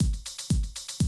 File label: 120, Classic Drum Loops